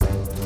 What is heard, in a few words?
Music Segments Neutral Theme Start Bpm128; asterix obelix slap them all 2